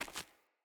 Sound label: Walk Stone, Footsteps; Characters; Mother